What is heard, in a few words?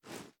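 pistol reload empty fast extended mag arm; gmod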